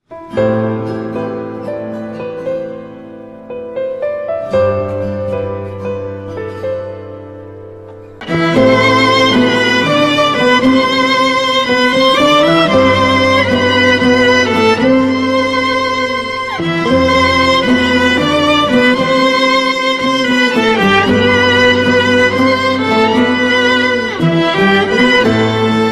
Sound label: turkish ringtone; the promise; yemin